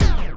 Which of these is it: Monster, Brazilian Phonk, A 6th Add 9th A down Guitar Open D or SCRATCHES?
SCRATCHES